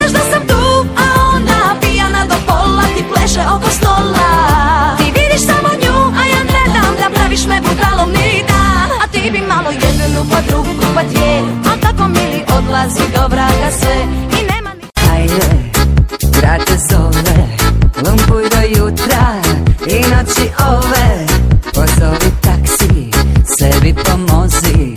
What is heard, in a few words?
croatian ringtone